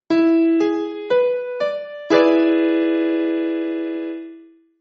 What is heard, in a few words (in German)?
E dur 7, konzertina; kunstklang